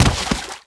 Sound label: body fall water